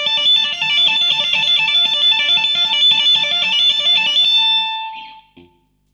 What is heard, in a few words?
mesa solo